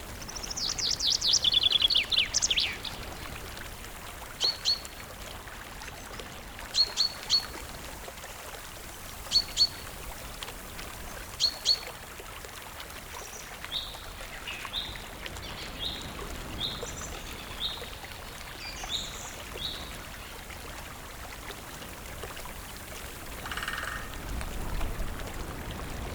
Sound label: forest, relax